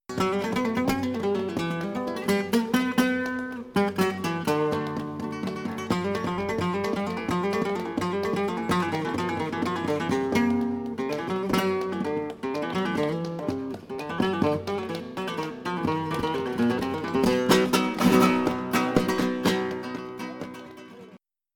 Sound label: Falseta